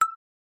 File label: cursor, button